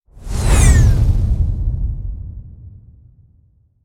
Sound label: Whoosh Spaceship Asteroid Vehicle Flies By